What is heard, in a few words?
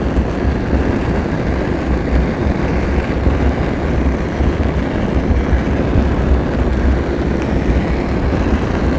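large fire loop; Star Wars Knights Of The Old Republic I; XBOX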